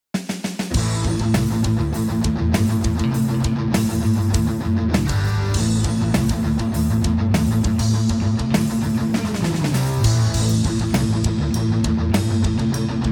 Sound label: Camels Race, riff, guitar